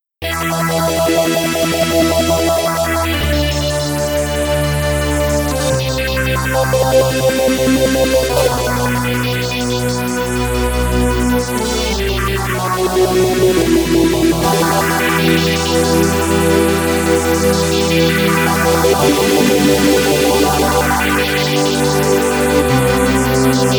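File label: lead ampere energy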